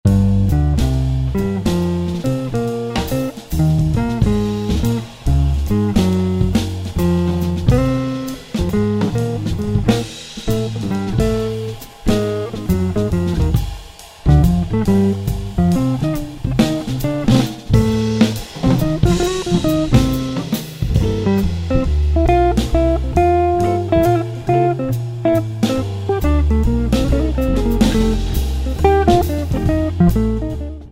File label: Blues